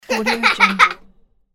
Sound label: child laughing